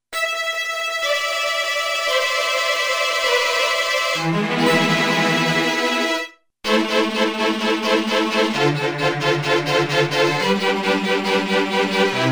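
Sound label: Tremolo Strings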